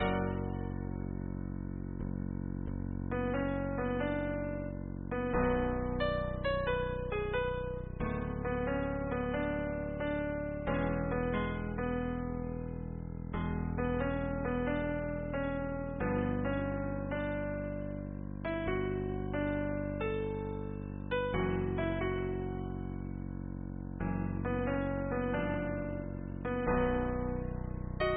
gospel pur piano

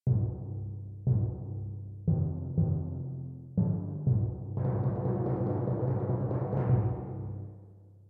timballes exemple sonore

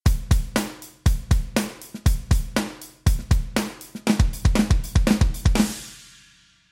batterie exemple sonore

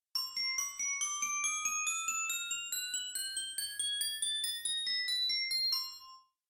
glockenspiel exemple sonore

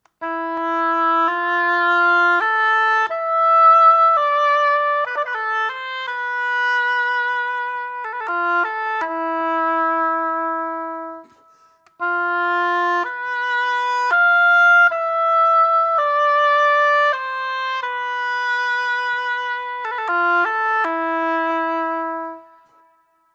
hautbois

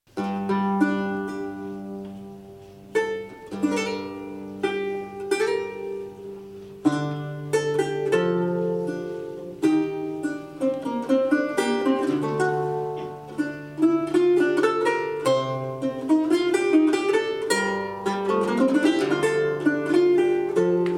luth prélude; Dowland

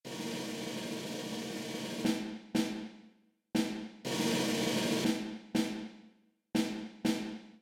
caisse claire exemple sonore

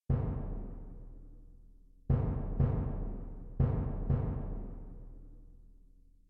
grosse caisse exemple sonore

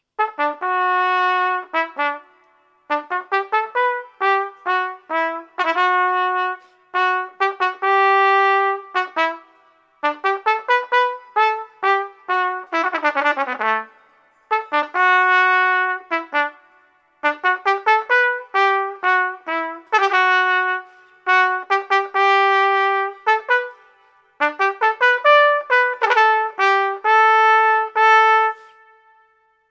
Trompette

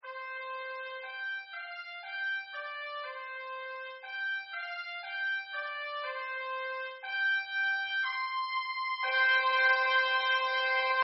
trompette exemple sonore

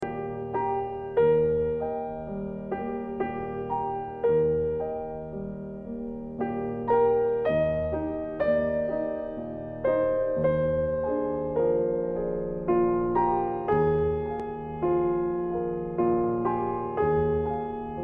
Berceuse; brahms

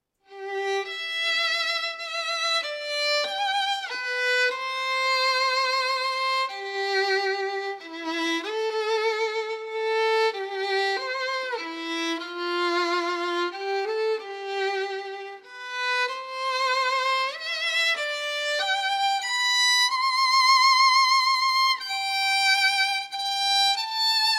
violon

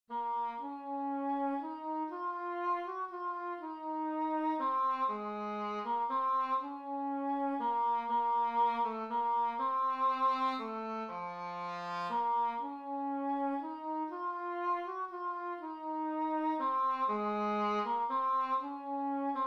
cor anglais greensleeves

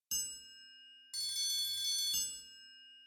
triangle exemple sonore

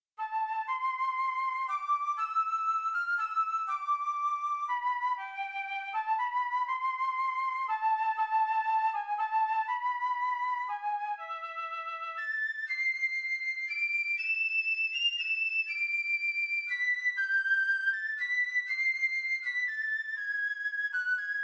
flute piccolo greensleeves

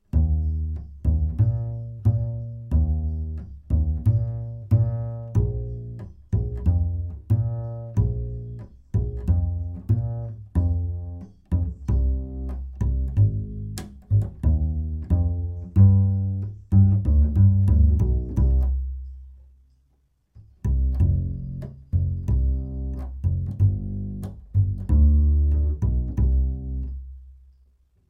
contrebasse

